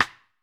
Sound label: Vintage Snare Drum, 3.5X14; Q Up Arts; Johnny.C's Vintage Snare Drum